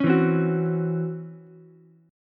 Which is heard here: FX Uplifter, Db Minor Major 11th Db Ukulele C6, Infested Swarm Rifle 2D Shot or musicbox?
Db Minor Major 11th Db Ukulele C6